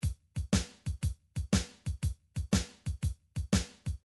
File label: midi drums